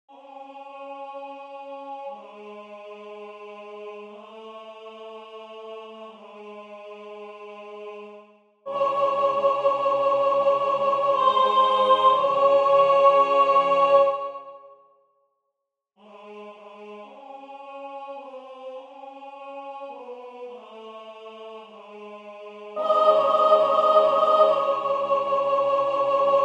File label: Ecce Homo superius